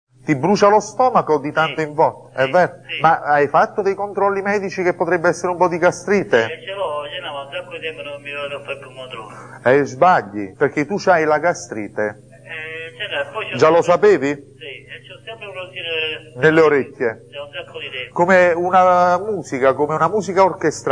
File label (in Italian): orchestrale